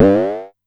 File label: Amdek Perc